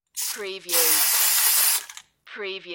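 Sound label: Aerosol Spray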